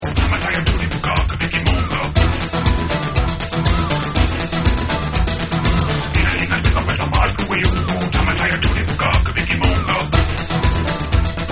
Guitarras Mix

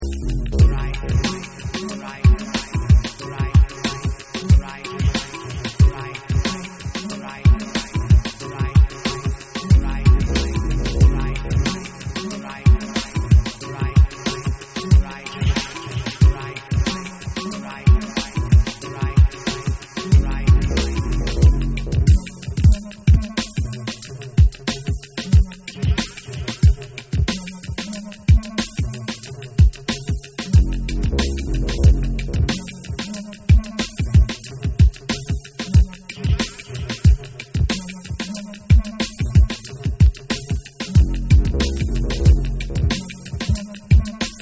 Instrumental

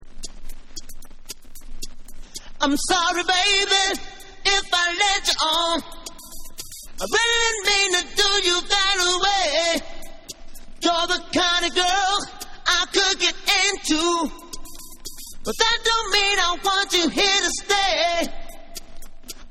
Acappella

